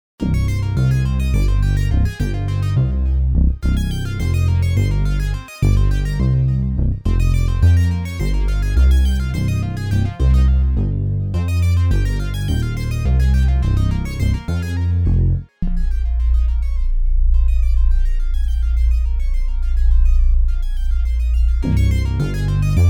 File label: etude synth 210 bpm; etude no 10